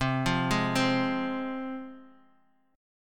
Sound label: C Suspended 4th Sharp 5th C Guitar Half Step Down